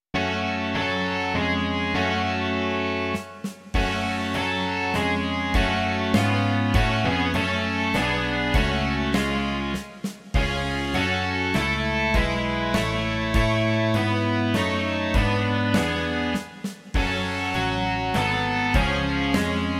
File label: rock